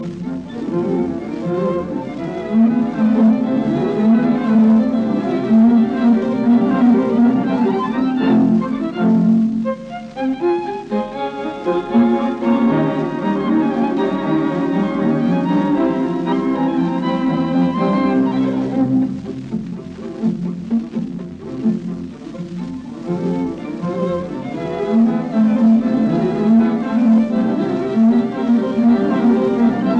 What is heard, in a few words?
String Sextet in B flat major Scherzo Allegro molto; Brahms; Op 18; Adolf Busch